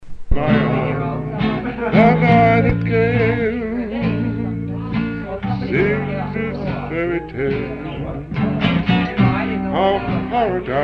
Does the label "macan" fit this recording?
No